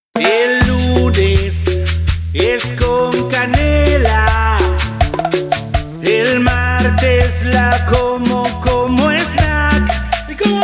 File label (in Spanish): CUMBIA Tropical Argentina jingle